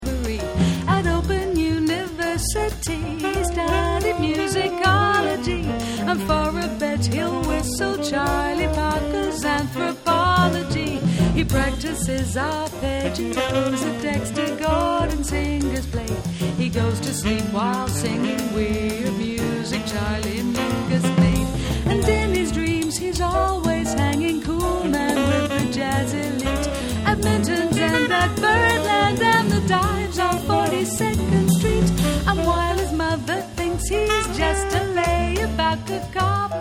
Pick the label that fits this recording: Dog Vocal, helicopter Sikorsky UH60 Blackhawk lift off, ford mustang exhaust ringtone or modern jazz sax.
modern jazz sax